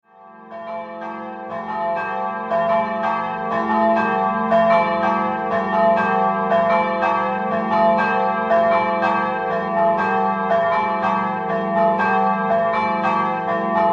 BRISCHIS, campane

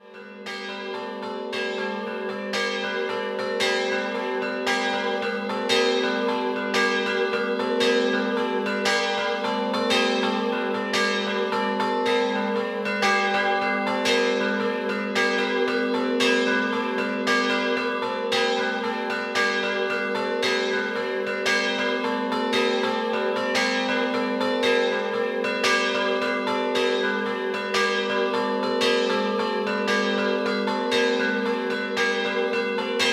Rivis Scampanottata, campane

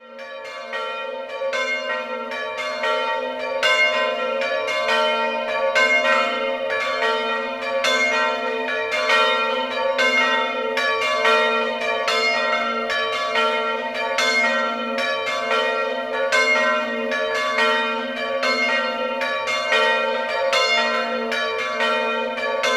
Basedo, campane

